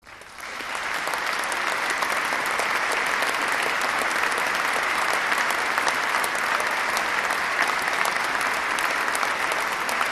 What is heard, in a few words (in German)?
Applaus fuer das Orchester; Konzertmitschnitt vom 20. Dezember 2016